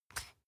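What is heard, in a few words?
toothpaste open twist, Bathroom; haus-garten; badezimmer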